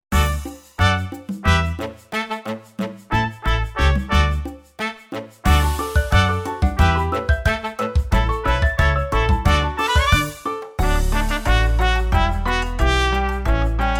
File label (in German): Ohne Synkopen